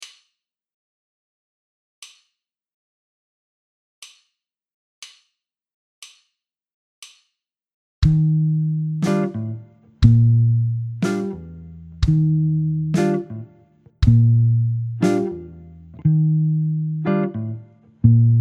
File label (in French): figure ballad base, guitare jazz